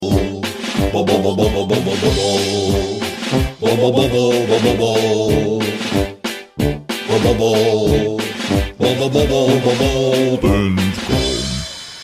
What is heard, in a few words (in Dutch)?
reclame muziek; bol com